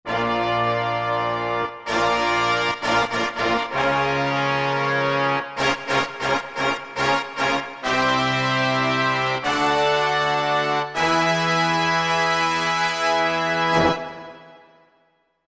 Bigger Brass, Roland SRX-03 Studio SRX

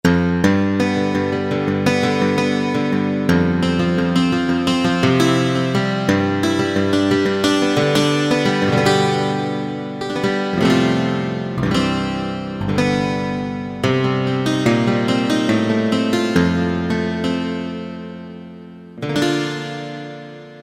PL Steel, Yamaha TG77; Factory Presets

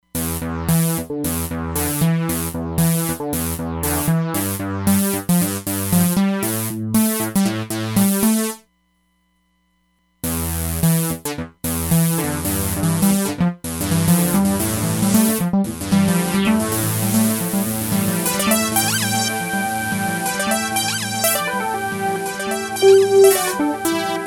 1 Second Pan Echo, Roland DEP-5; Factory Patches

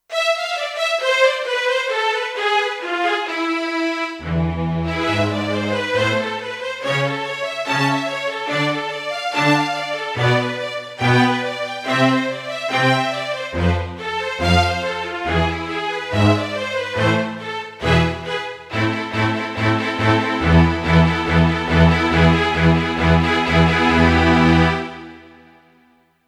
Fast Strings, Kurzweil K2500X; Factory Programs

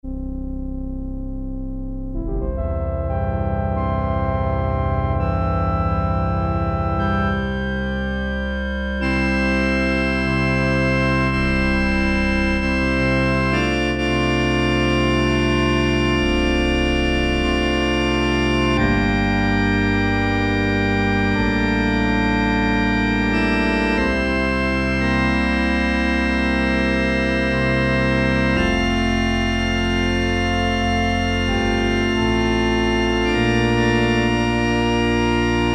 PIPE ORGAN, Roland Jupiter-8; Factory patches

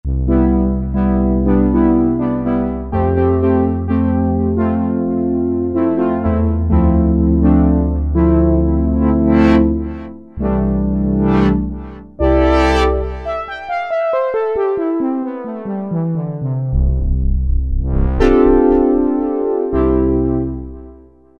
Strings-Brass; Cheetah MS6

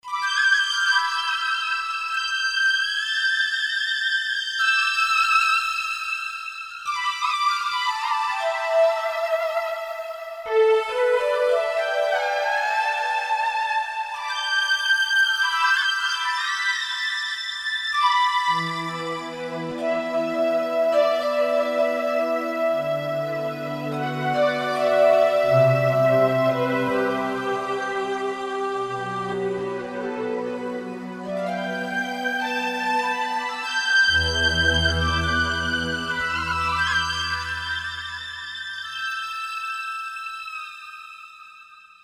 Air Lead, Korg Combinations

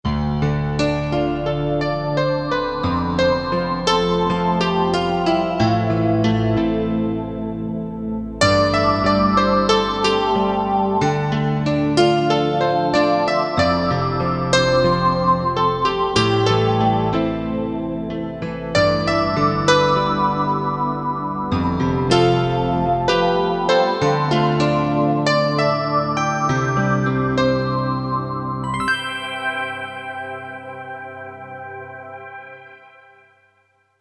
Korg MSC-9S Organ; Bellissima

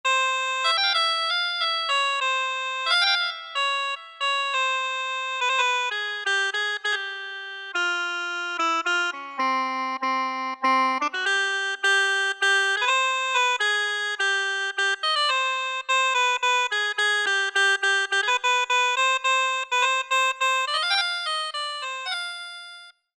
Shanai; Factory sounds

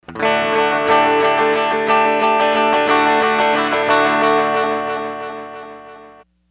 Edge Tone DI; Sony HR-GP5

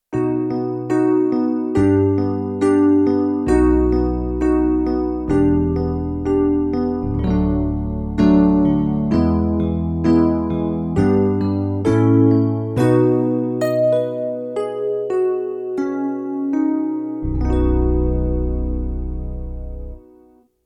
Bell Rhodes, Roland D-70; Factory Performances

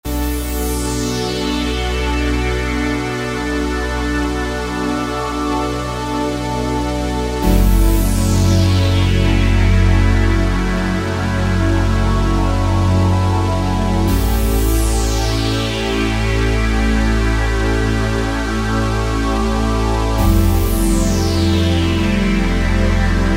Ultra Res. Sweep; Korg TR-Rack

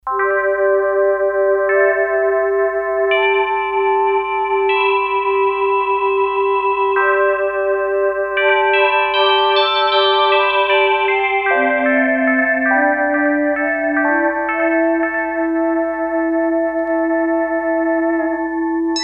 East Pond, Korg DS-8; Factory Patches

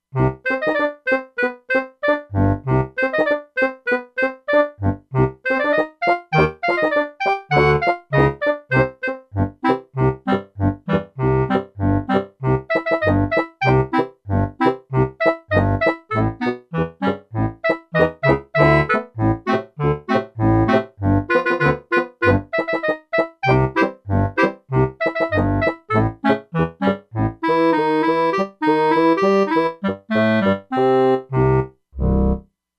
Clar-Bassoon, E-mu Proteus-2; Factory Presets

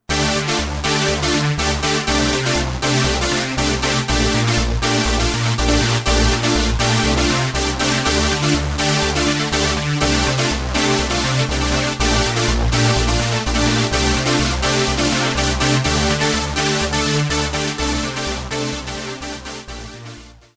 Dance Stack, Roland SR-JV80-09 Session

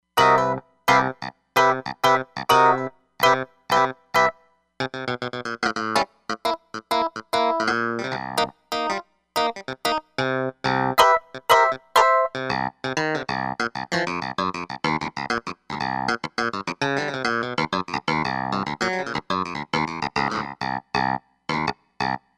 Funky D, Roland Fantom XR

